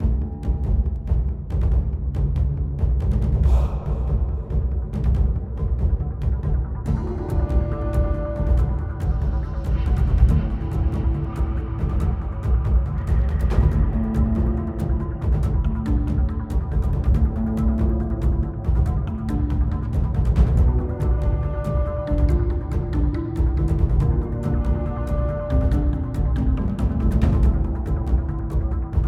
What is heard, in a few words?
challenge room music; primal light linux macos switch windows xbox one xbox series xs gamerip 2020